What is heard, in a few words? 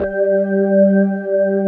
B3 HAM, Keyboards Old School